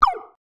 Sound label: retro laser; sci-fi